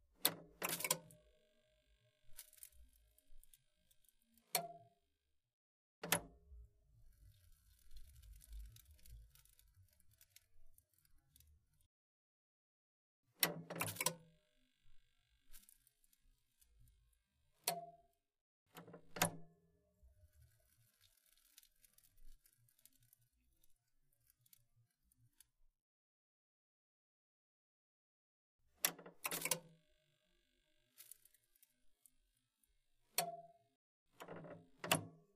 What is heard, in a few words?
Turn on, turn off the old monitor; computer